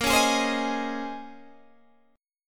A sharp Minor 11th A sharp down Guitar Half Step Down, chord